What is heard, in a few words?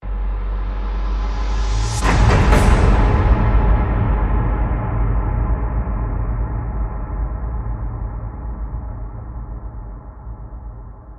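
Hit Hor Terrifying, horror hit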